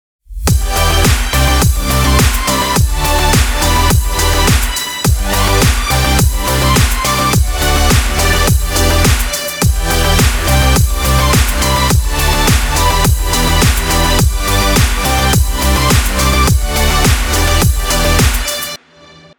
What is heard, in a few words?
Drums